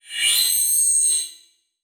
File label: Multimedia Internet CD-Rom Flash Wipe